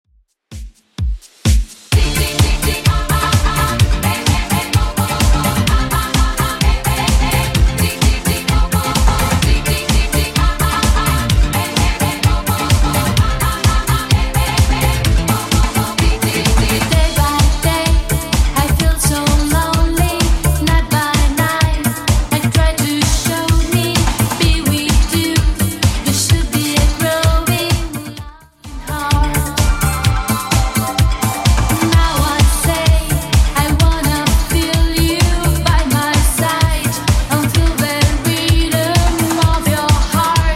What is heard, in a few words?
80s Redrum 128bpm